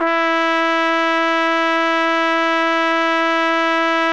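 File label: brass, cheese trumpet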